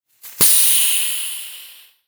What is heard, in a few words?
air; planetoid; Cosmic Rage; The Experience-light